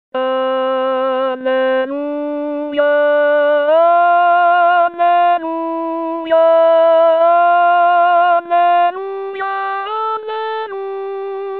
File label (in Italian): Contralti; Alleluia Cantico dellagnello; corali in vaticano 2024 voci guida contralti